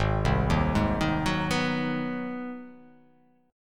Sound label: Ab 7th Sharp 9th Ab down, Ab7#9 chord; Ab7#9 arpeggiated; JGuitar